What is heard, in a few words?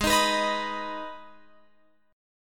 Minor, Guitar, Open F; G#m strummed; Gsharp; x,15,15,18,18,18